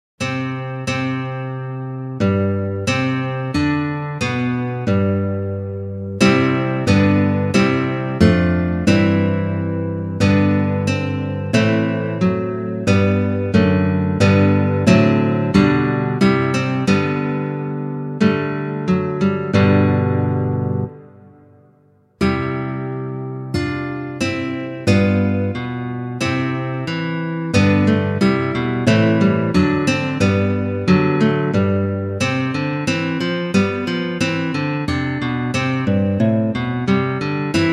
O Come All Ye Faithful Adeste Fideles, Christmas guitar